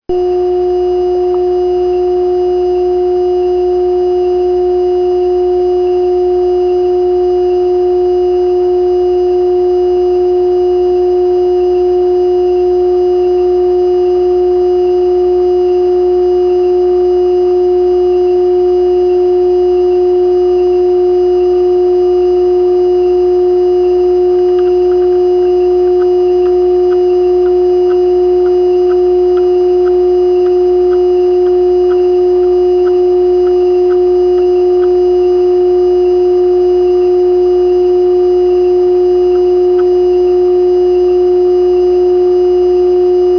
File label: Accutron hum